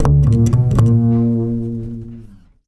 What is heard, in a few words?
Double Bass F; Total Drum&Bass